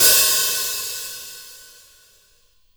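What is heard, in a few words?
Kit HH Open, Drums Acoustic; USB Soundscan vol.10; AKAI; 90s sample CD; ALL OH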